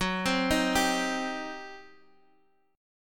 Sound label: G Major 7th Suspended 4th Sharp 5th, F sharp